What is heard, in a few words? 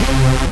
RAVE, RAVESYN